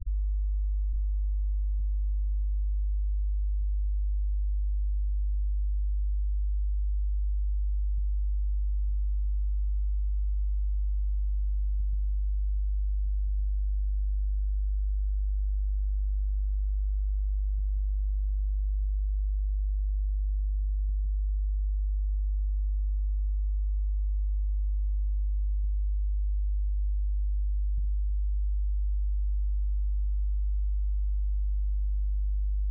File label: Pontese stream